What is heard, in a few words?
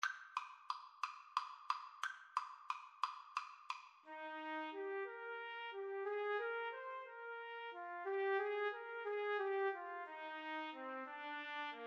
TPT trio, Trumpet Trio